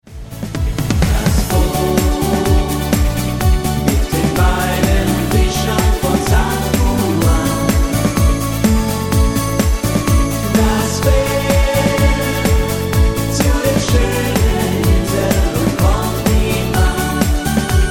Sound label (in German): mit Chor